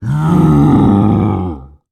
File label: zombie